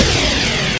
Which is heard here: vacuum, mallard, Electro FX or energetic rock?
Electro FX